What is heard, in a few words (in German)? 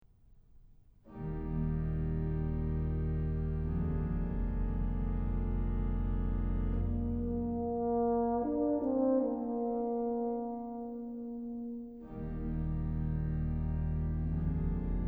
Andante fuer Horn und Orgel; foerderverein kirchenmusik; Lobe den Herren